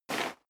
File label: step sneaker gravel light slide short